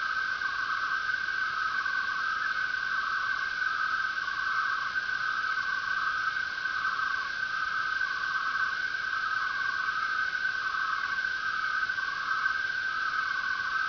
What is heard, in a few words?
crickets many